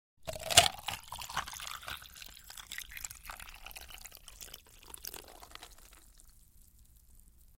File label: Liquid Pouring Over Ice Into Glass Fills Up; Household